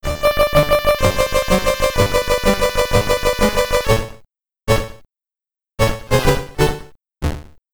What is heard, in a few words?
Game Over (SID Stereo); Thing Bounces Back; Commodore 64